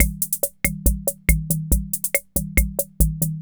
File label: 140 POPS